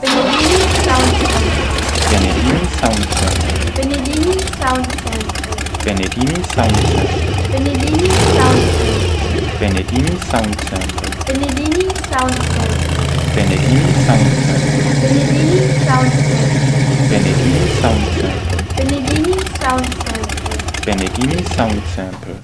V8

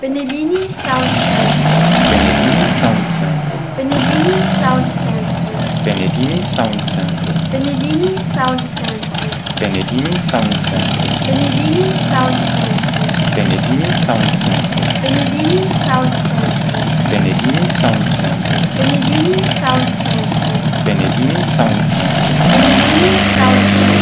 Yak-3

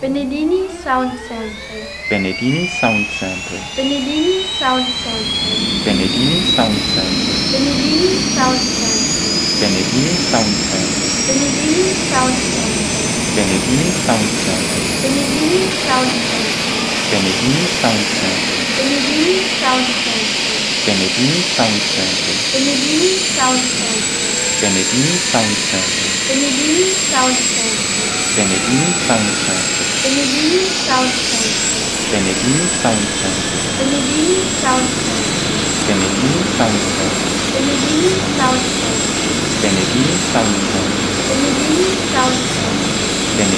Augusta AW139

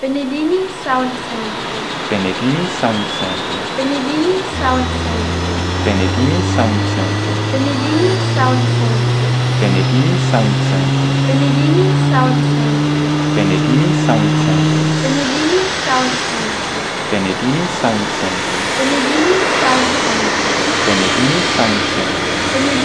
Apache

